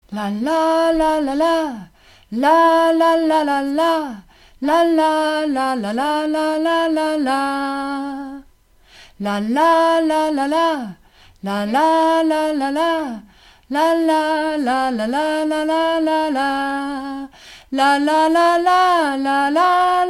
Alt Refrain LaLa

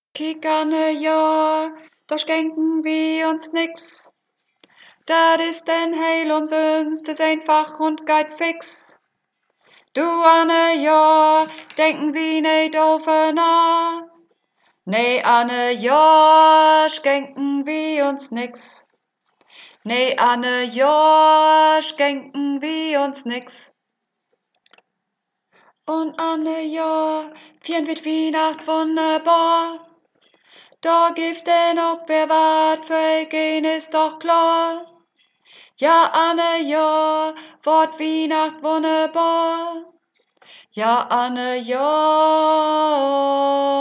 Rap Version, Alt

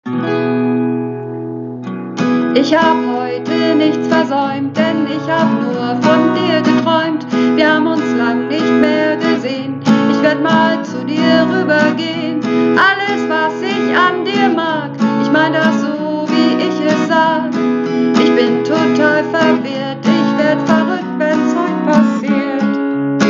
Hauptstimme

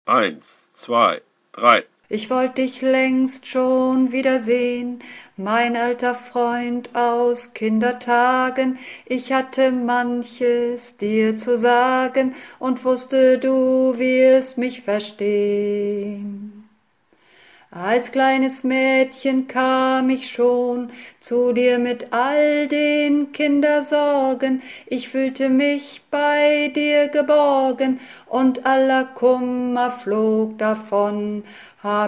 Bass

